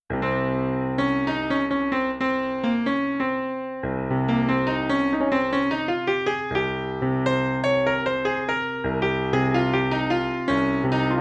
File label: Short Improvisation in C Phrygian